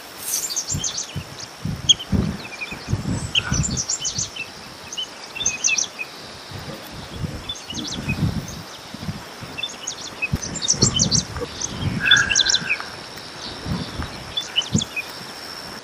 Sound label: correndera pipit